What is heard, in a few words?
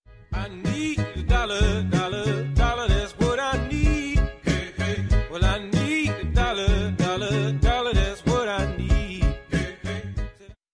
follow sound alert sound and donation sound; twitch